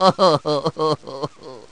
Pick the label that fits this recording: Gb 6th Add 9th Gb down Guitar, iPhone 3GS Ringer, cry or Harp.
cry